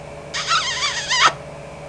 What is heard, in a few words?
kiss